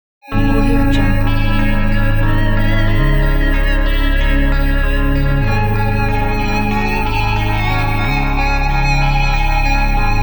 scary scifi

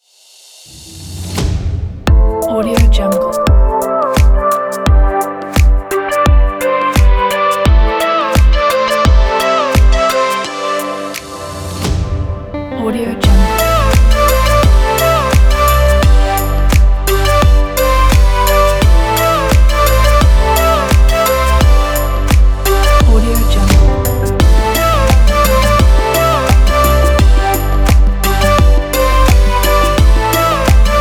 chill summer tropical house

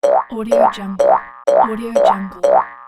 cartoon bouncing

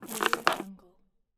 bow draw; stormwaveaudio

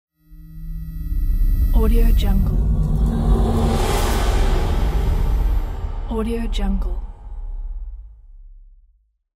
ghost

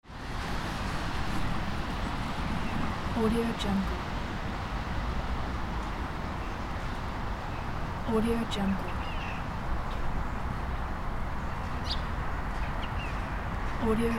urban park ambience

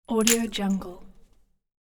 couple stones drop

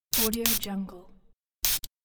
spray bottle

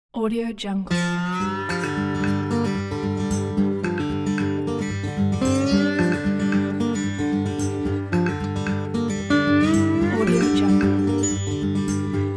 western sunrise

